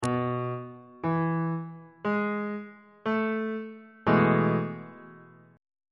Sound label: a major 7th suspended 2nd 1st inversion on bass clef